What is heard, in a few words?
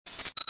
fuelpump